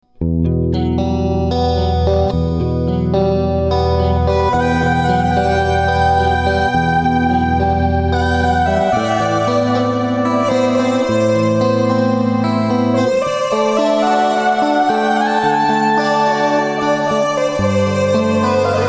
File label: mizu, ewi